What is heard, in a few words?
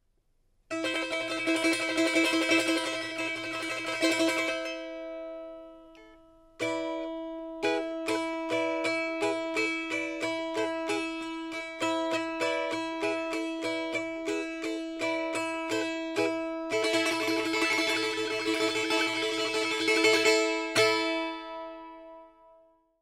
balalaika